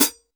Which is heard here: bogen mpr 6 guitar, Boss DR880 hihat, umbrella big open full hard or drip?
Boss DR880 hihat